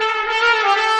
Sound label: trumpet danger; music